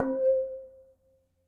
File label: Glassimba (Multisampled), mallets; Ableton; Mood Reel; Majestic Mallets